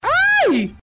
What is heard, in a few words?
aaaaaiiii